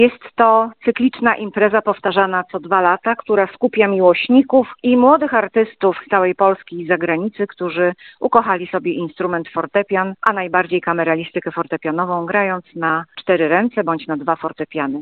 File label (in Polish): fortepian; viii miedzynarodowy konkurs duetow fortepianowych